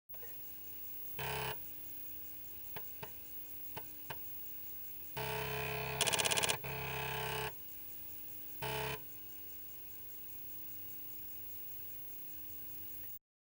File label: c1541ii init